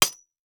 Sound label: Impact Ceramic Debris Hit By Copper Pipe; Impacts